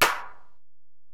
EPerc, Alesis DM-PRO; 300 Drum Machines

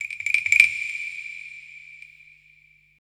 SIERRA PER; Best Service Advanced Media Trax Building Blocks

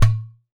GHATAM, DRUMS OF IND; AKAI S6000 CD-ROM; WorldSounds

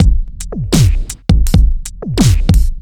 Kit Wonk, 85bpm; off the grid

